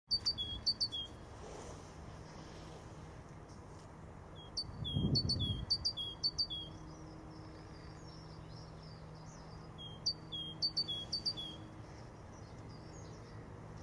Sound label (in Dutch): zingende koolmees moestuin